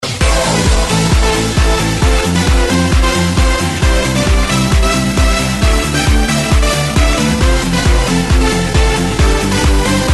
trapsition 128 to 70

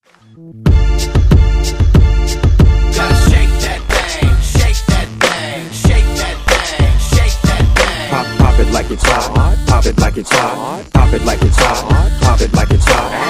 Hype

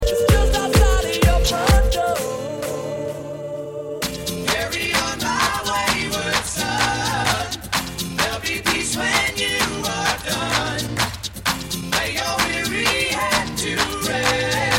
70S 80S ROCK MEGAMIX

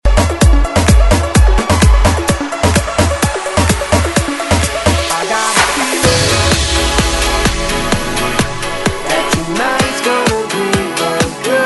ELECTRO HYPE

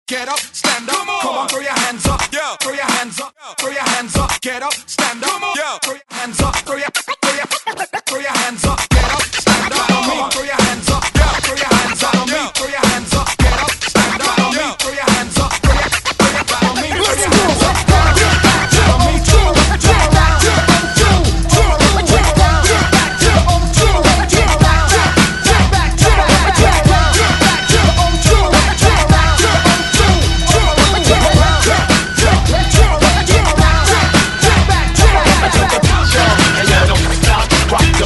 Hype Scratch Acapella Intro

